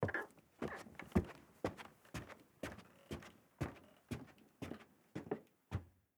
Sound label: Footsteps Carpet Stairs; Haunted Bloodlines; SFX Producer